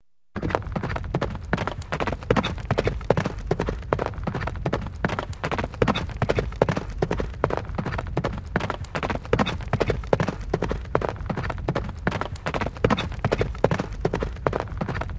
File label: Horse Fast Running L